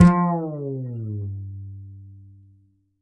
Upright Acoustic Bass

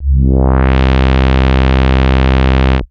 Bass Note C

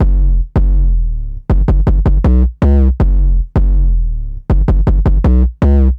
Distorted Bass, Drum & Bass; Fluid Dynamics

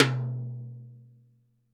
TD-20 Tom A, Roland TD-20 Toms

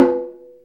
Morocco, ethnic drums; Zero-G Ambient 1

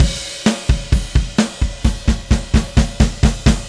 Drums Crash, Indie Rock; Sonic Foundry (Sony Creative Software); Indie Rock Anthems; Session 10 - Roswell

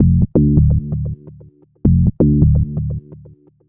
NRG Bass, Trance

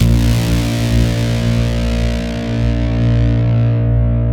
stick, Chapman Stick; Analog Odyssey

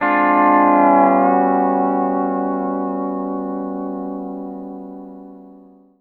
PEDSTL CHORD, Country; A6 9

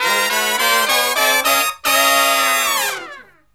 SLEEP, Killer Brass Riffs; USB Soundscan vol.29 - Killer Brass Riffs; AKAI

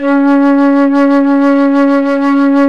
FLUTE & BELL; OMI - Universe of Sounds

